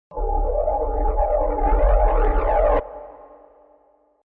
Crpy Low, Creepy low-pitched background sound excellent for evoking fright and fear